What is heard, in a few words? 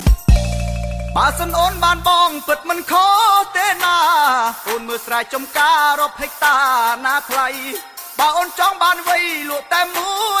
Khmer Song